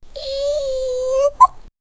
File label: a short sound effect of, crying